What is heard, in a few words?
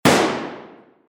Gunshot